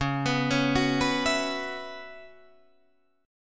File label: Db Minor 11th, Guitar, Drop D